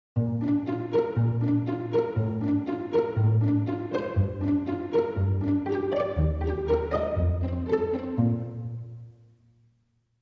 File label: Strings Only